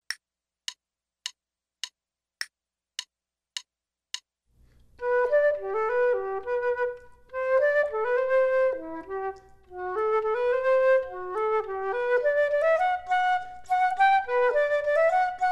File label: Duets flute; Selected Duets for Flute Podcast